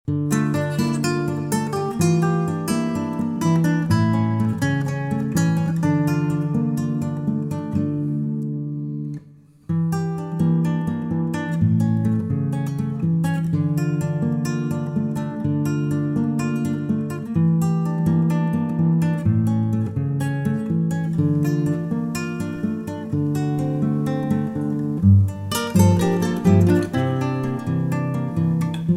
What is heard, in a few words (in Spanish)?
Pista instrumental sin melodia